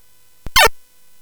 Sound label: Space Gun